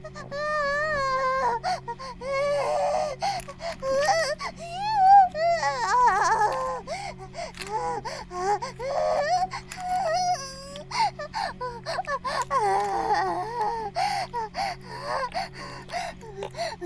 バイブ放置, ASMR

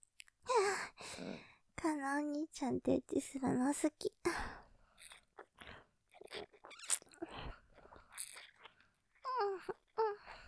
舐め おっぱい愛撫で; お兄ちゃんとエッチするの好き; ループで楽しむ！シェフの気まぐれ抜き抜きコース; モノラル

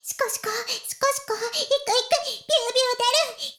シコシコ音声; 古き城のドラゴニート2

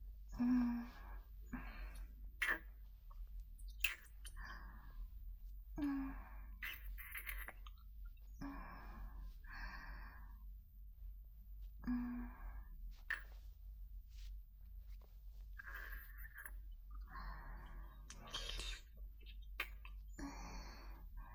添い寝キス, ASMR

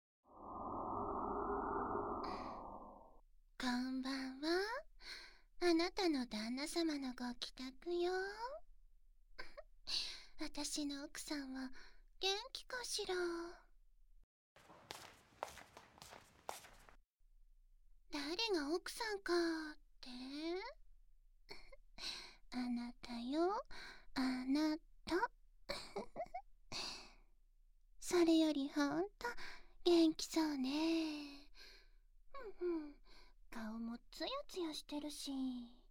囁き、吐息責め、耳舐め、ディルド挿入 効果音入り; サキュバスによるアナル拡張～出産準備

